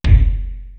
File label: wet kick; East West; Global Sounds